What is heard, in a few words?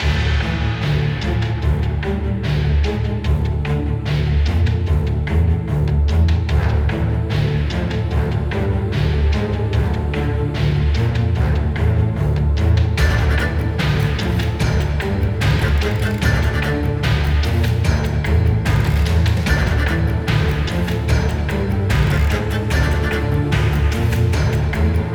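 High Intensity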